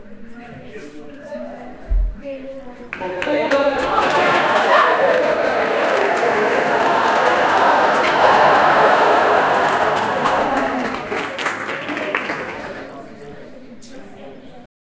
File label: sound of audience in a